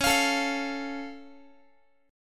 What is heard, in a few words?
Db Augmented, down, Guitar; strummed; JGuitar